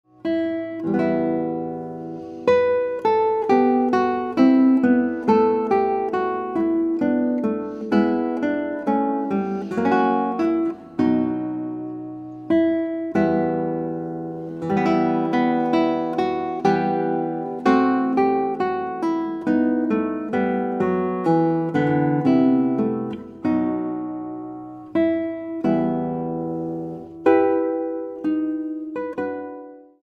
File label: Guitar